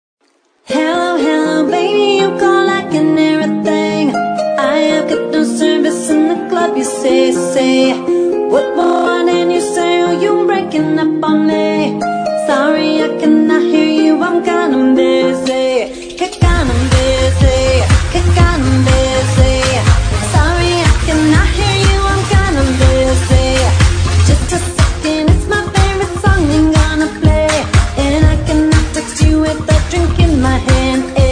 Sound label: Cover Band